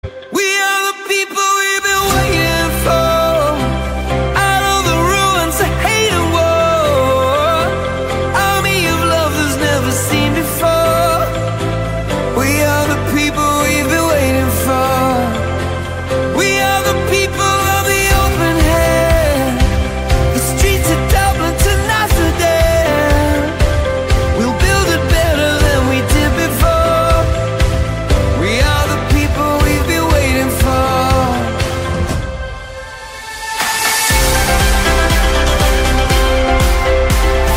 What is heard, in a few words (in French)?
Hymne Euro